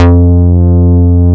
Synth Bass, Roland

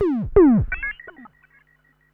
Perc Synth Moog Delay

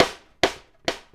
Sidestick, Drums, Snare Patterns; Sonic Foundry (Sony Creative Software) - Essential Percussion

